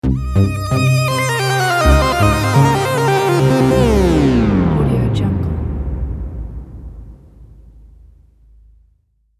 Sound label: futuristic blues logo